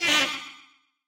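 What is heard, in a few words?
Woodwind damage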